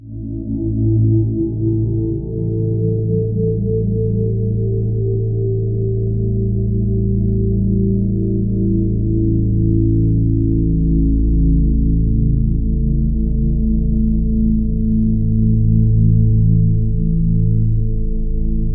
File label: Ominous Drones, VOX STYLE; USB Soundscan vol.48; AKAI